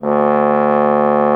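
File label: brass bass trombone D; Miroslav Vitous; Solo Instruments VOL-1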